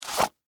Gun Holster Remove, Gun, Holster, Remove, Handgun, Pistol; Warfare; Pistol Sound Effects